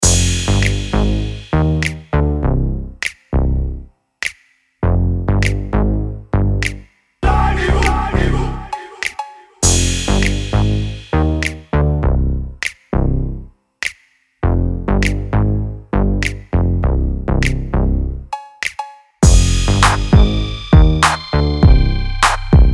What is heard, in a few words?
Instrumental